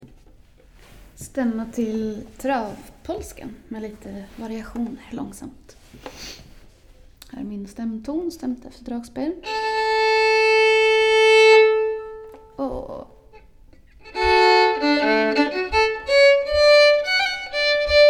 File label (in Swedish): Travpolska stämma långsam